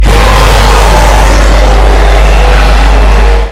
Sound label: jumpscare sound; five nights at hanwils r